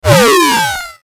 static oscillation; Static Oscillation Fuzz; Production Element Imaging Element Accent Transition; Blastwave; Static Sound Effects

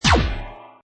Hi Tech Panel Servo Button

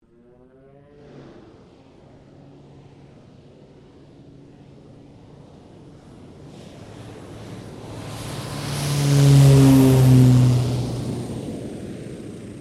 King Air 350 takeoff, stereo; Airplanes; Vehicles

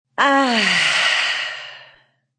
Female Ahh Sigh

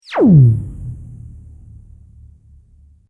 Sweep Organic Medium

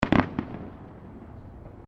Fireworks Multiple Firecrackers; Fireworks (Sound Effects:Backgrounds); Crafted Signals

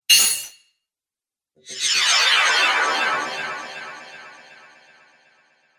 Magic Spells; Magic Sound Effects

